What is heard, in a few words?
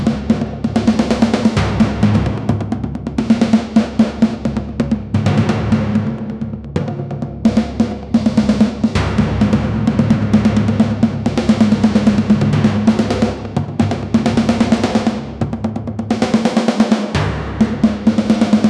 massive war drum loop dee; battlefield